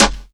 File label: DRMS, Underground Hip Hop